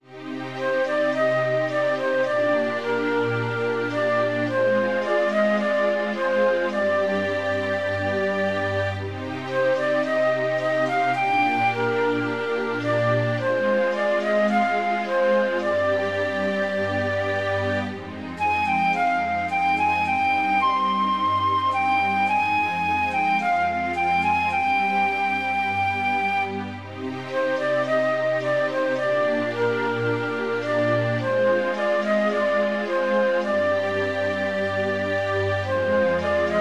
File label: Death; Ultima Underworld 2